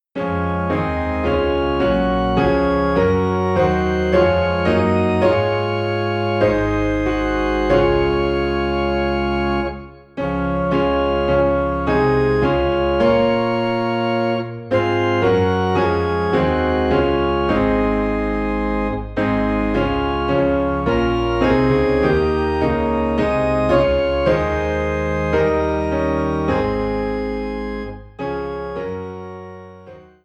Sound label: Piano and Organ in D Flat; Rejoice the Lord is King